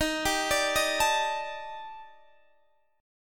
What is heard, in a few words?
D sharp Major Sharp 11th, Guitar, Standard; arpeggiated; JGuitar